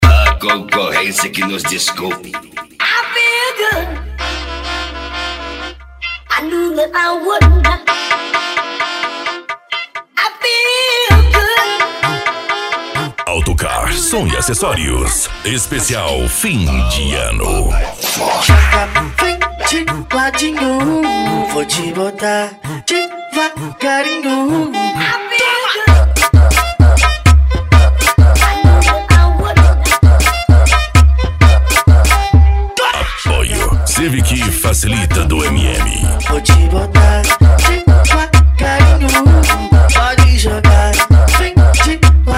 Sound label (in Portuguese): funk agressivo ritmado e funkadao